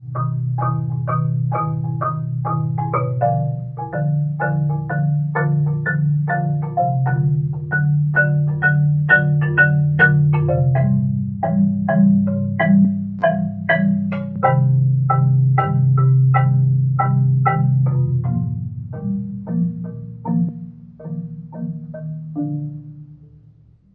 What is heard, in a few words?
marimba; improv: Marimba